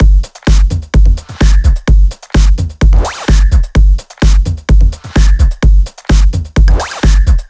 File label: 128BPM Kit; Vengeance Samples; Mars Kit